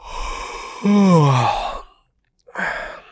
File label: YAWN; Euro Truck